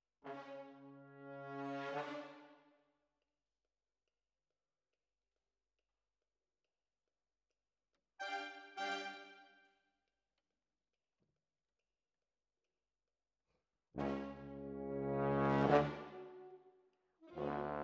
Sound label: Tromb ASS